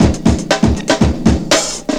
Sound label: end fill, breakcore Breaks